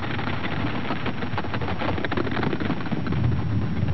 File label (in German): Pferde